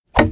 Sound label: wood Hit; Grammar Games; Pronoun Games